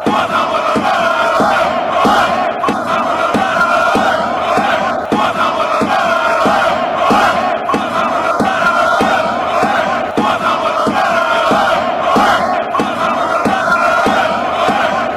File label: forza appolon, chants